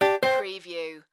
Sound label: Casino Electronic Sound Winning Tone